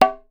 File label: BATA OCON; Voice Crystal - Steve Reid Definitive Percussion